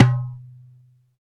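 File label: R8 drum, AFRICAN PERC; Roland - LA Composer vol. 1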